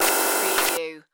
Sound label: digital data glitch